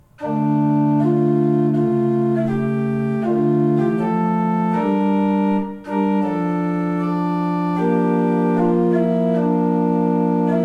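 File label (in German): Baltes 6 I, pfeifenorgeln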